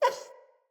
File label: Wolf Cub Trapped Call Close; Animals; Wolf Cubs; Orengal Wolf Cub A